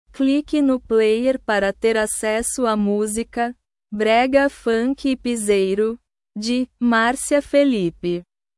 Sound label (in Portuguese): brega funk e piseiro